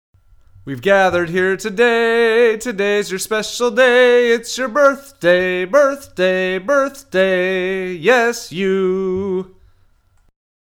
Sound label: Birthday music; khareji